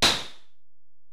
EPerc, Alesis DM-PRO; 300 Drum Machines

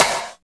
SNR, Tekno Industrial; East West

